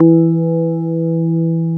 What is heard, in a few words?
WOOD BA; Synclavier Sampler Library; KEYS AND GUITARS